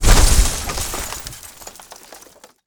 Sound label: car crash